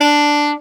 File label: A SAX POR D3, Alto Sax Portato; Orchestral; VarazdinOrchestral